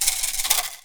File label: PU IL, HAWAII PERC